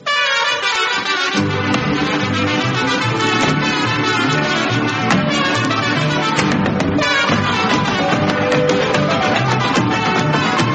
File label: mexican hat dance